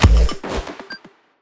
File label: ui start sign